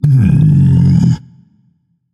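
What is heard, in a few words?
non-verbal thinking; smite; atlas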